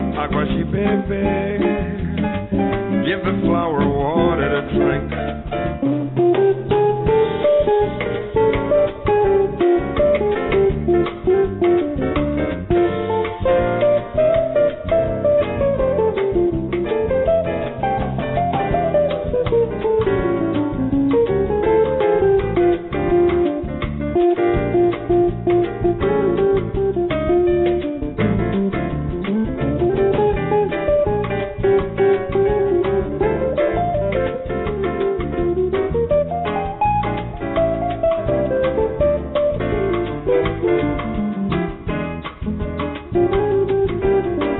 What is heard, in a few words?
Solo